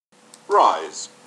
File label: rise